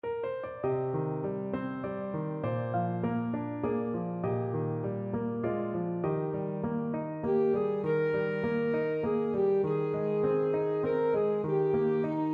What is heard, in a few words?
ASAX, saxophone